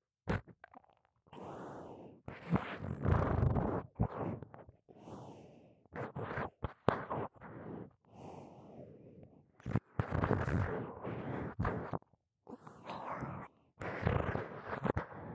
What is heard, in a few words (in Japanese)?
左耳舐め